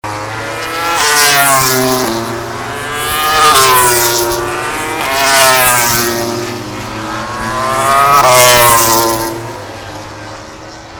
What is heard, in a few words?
Racing Sound